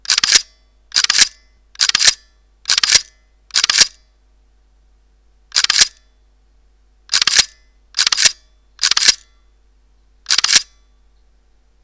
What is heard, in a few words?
continous low RAW, Pentax K-m